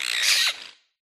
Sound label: Bird DOT; smite 2; Aphrodite